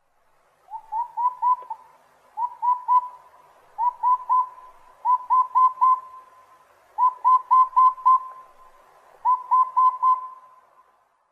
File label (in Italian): Civetta Capogrosso; Aegolius funereus; Versi Animali; Il Forum della Fotografia Naturalistica